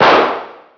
COLT; Hidden & Dangerous; h&d demo

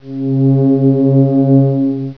cav organ; Prince of Persia 2 Demo

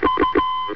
RADAR Locking; axisdemo; Interface

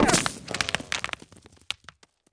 stone; Waterloo

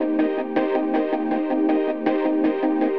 AMBNT 160; eJay; Ambient

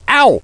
OWWW; beavis; tennis

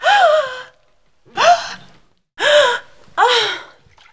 woman gasps; Vitra

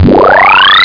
payoff; pvpoker

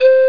Pan Flute (C4); Flashback

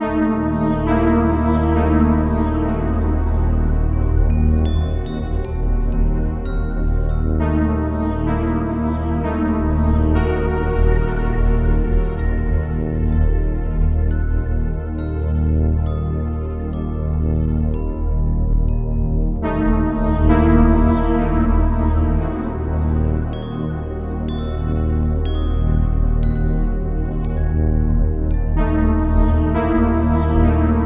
dungeon; PC Gamer IT CD 31; REALM

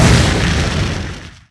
Hit

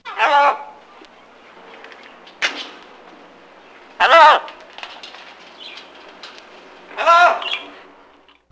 parrot

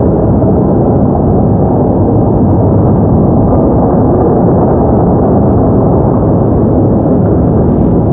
water machine; PC Gamer IT CD 41; Daikatana

